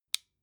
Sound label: flashlight release